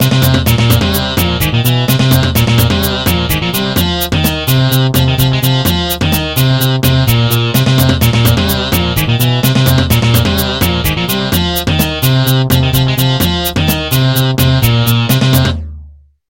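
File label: Level Complete, MIDI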